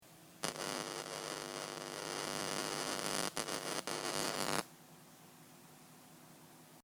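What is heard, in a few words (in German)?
störgeräusch, Monitore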